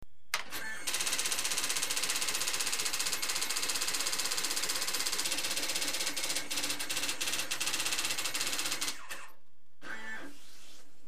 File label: printer